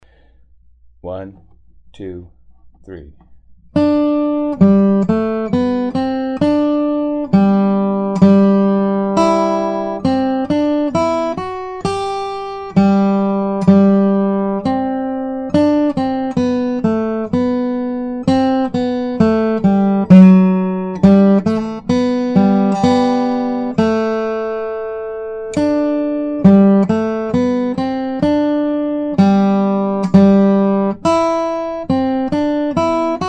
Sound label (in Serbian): Bach Minuet, Gitara